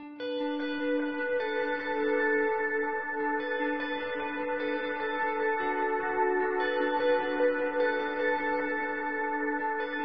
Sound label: AMBIENT GUITAR REFLECTION